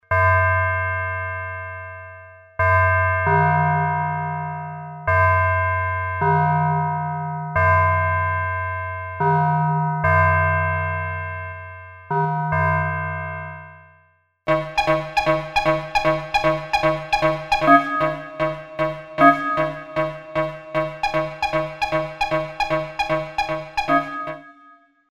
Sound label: sine demo